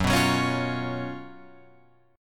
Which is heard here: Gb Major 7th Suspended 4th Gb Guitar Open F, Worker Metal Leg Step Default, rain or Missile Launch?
Gb Major 7th Suspended 4th Gb Guitar Open F